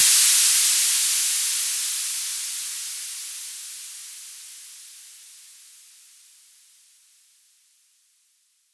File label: Ride, Dubstep One-Shot Drums